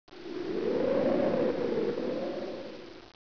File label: wind street; de torn